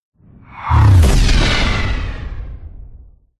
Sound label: sound design cinematic whoosh short into powerful boom with flanger; zapsplat